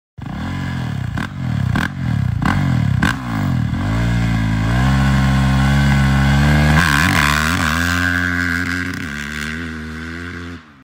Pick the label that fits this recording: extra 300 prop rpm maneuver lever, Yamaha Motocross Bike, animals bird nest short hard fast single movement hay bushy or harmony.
Yamaha Motocross Bike